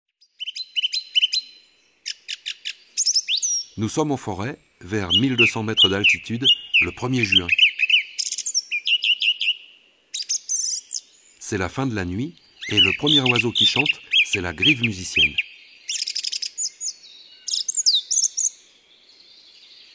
chants d oiseaux La grive musicienne; ABC des chants d'oiseaux

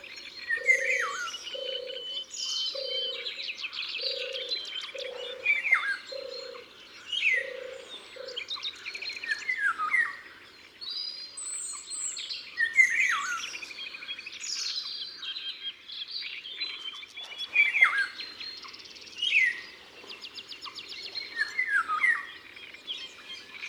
Loriot d Europe; ABC des chants d oiseaux

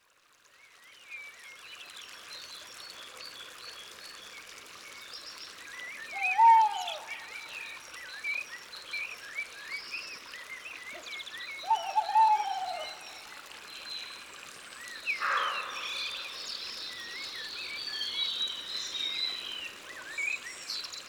Chouette hulotte; Isere; Concerts naturels de l'Isere; Studio Les Trois Becs

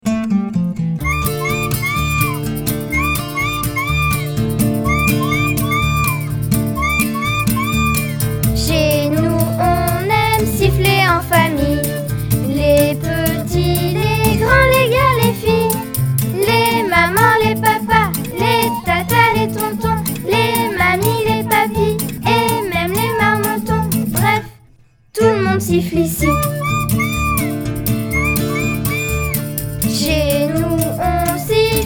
Chanson; Un jour à la montagne

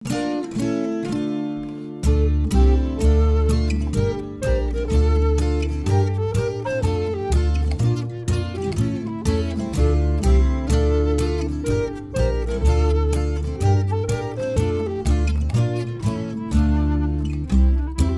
Monsieur Patou Instrumentale

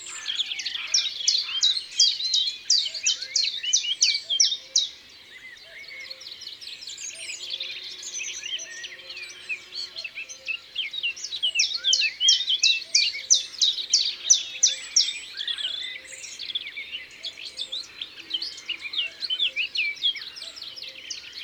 Pouillot veloce ABC des chants d'oiseaux

